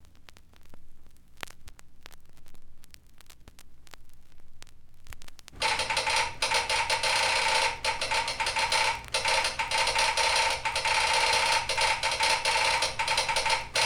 Railroad Telegraph

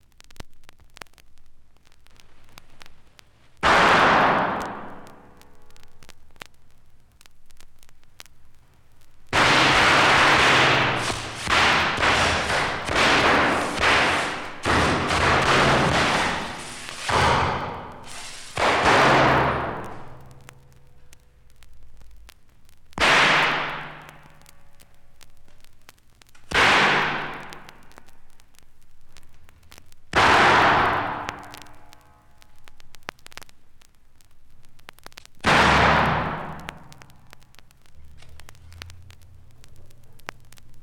Firecrackers

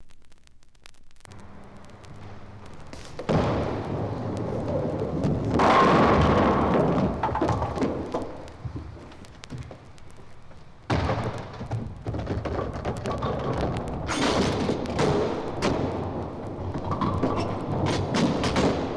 Bowling

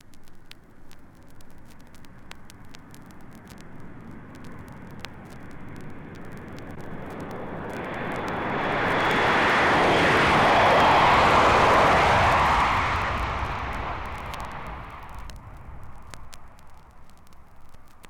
707 Jet Taking Off

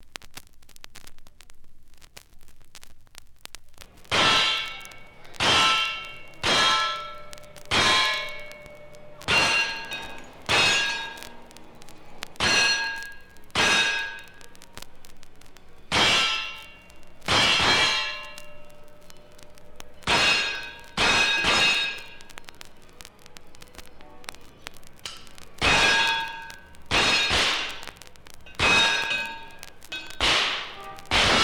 Shooting Gallery

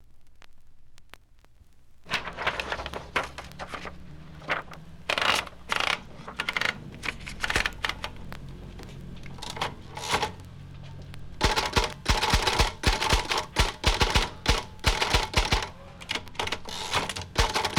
Royal Typewriter

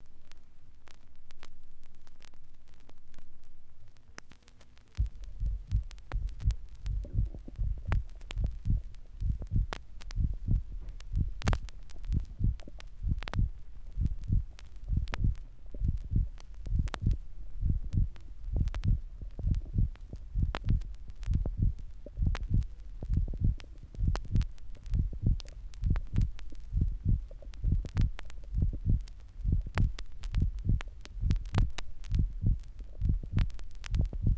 Heartbeats

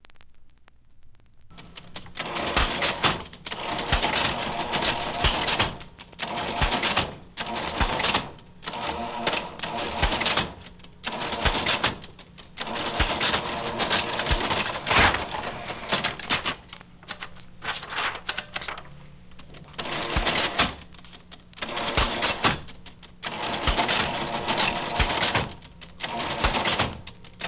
Electric Billing Machine; National Cash Register Electric Billing Machine

